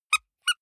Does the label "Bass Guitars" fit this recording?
No